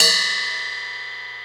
Cymbal Bell One-Shot; Drum n Bass; One-Shots; KJ Sawka; Drum n Bass & Beyond; Drum Tool Box

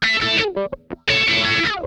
RASP, Funk Guitar; 90 s Sample CDs; Zero G

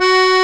TACK PIANO

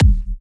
Kicks, Elektron MachineDrum; SPS1 MKII

